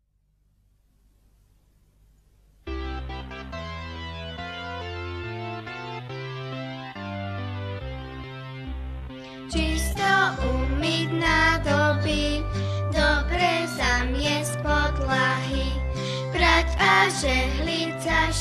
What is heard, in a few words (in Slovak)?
Piesne Pre Deti; Slovak